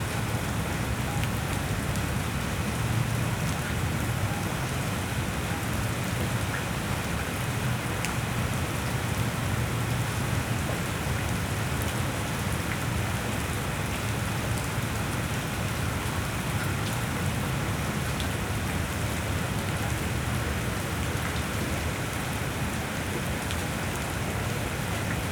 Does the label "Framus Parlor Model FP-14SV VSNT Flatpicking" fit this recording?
No